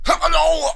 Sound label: Mercenary Death; London; Playable Tutorial Level; TR3